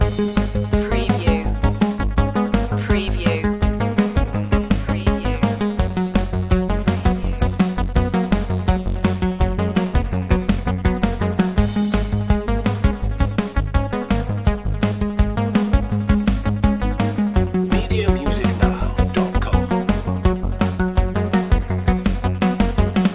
antiquated loop

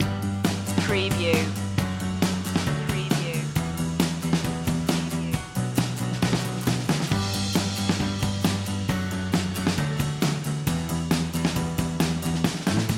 rainbow pop loop

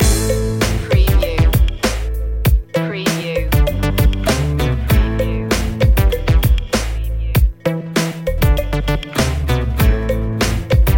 electro latino loop

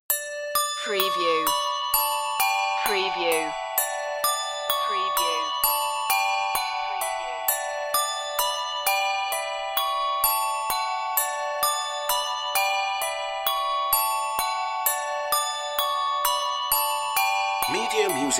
handbell peal

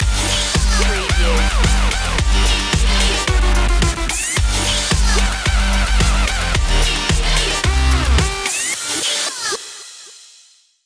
french punk stinger

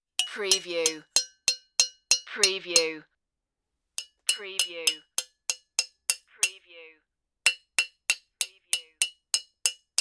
metal clanks

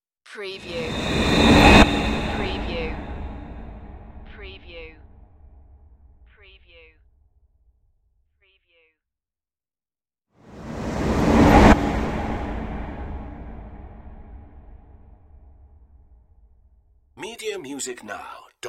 scary whooshes

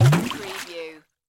Bubble Deep Submerge; sound-effects-bible

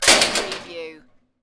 Metal Gate or Metal Door Slam

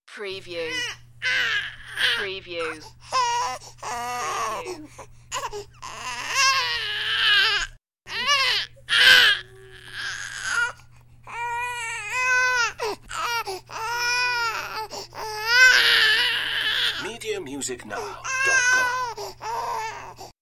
Baby Crying

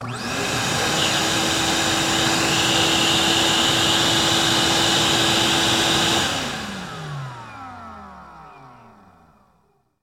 Vacuum On Run Off

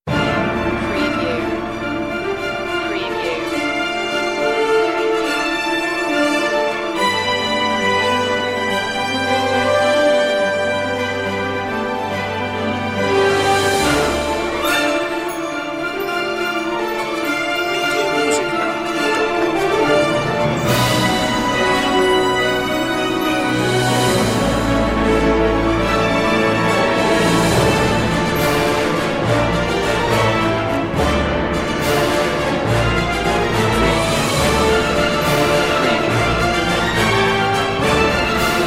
no choir

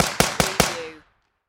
Submachine Gun HK MP5; Sound Effects Bible

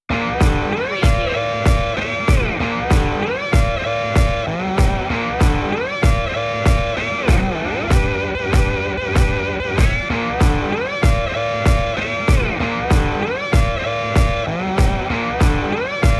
footstomp swamp blues

